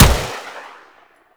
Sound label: Gorgon Shot, Weapons; Warframe; Void Trader